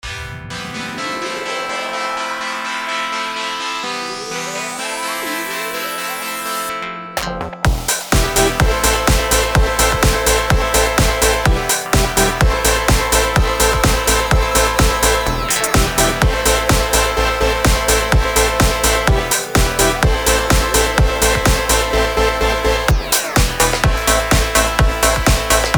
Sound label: one beautiful morning